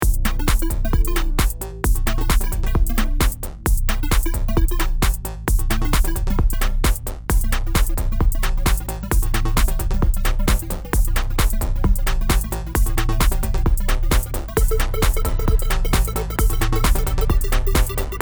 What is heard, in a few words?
song; space game